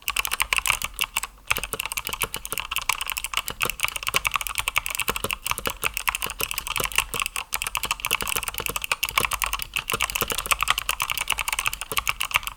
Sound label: msi vigor gk71 sonic soundtest